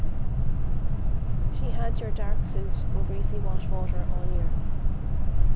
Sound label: mix Car